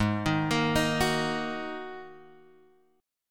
G sharp 7th Suspended 2nd G sharp, down, Guitar, Open G